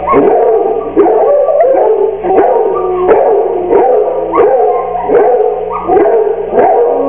hound sounds; hippy skater class of 2004

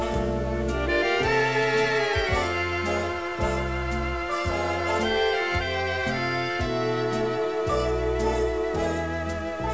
foxtrot

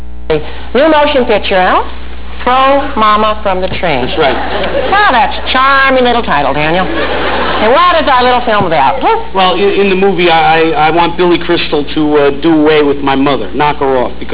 church

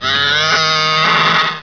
tauntaun

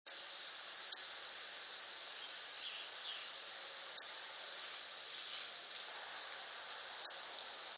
bunting chit, indigo; birdsongs

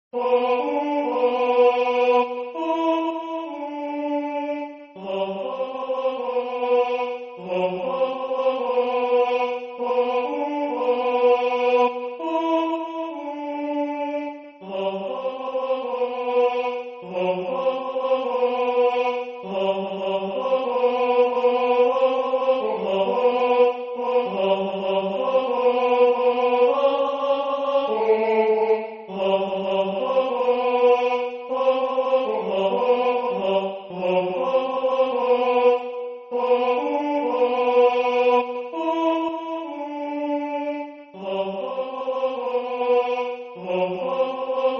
Balia Tenor

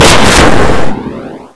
sniper

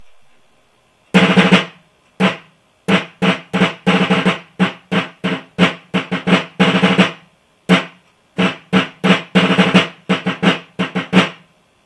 quickstep

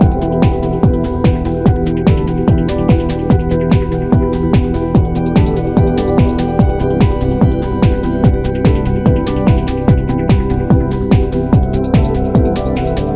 trance

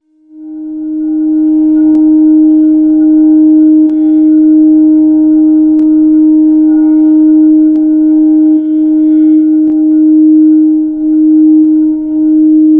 vibra

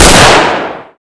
carbine shoot

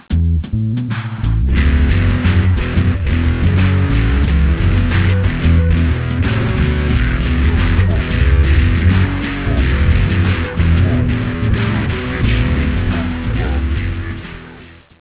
g funk

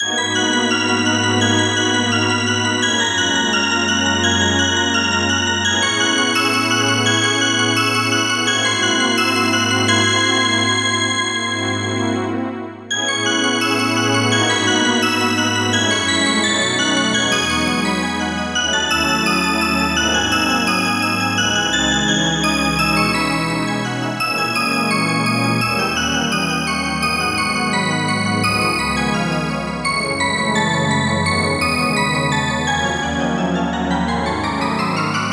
icecave

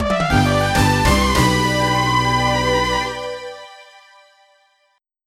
Victory; RPG Maker; Anime-Vidgames

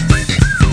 whistle

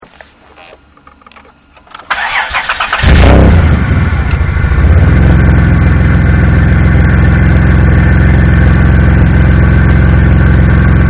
New Exhaust

